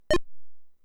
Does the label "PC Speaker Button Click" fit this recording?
Yes